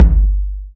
short reverb kick drum sound g key, Royality free kick single shot tuned to the G note.